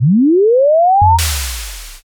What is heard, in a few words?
warp jump